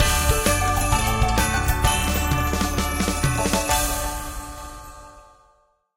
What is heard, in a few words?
scatter Win; alohaxmas